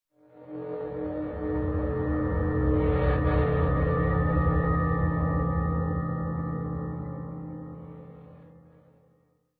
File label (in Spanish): suspenso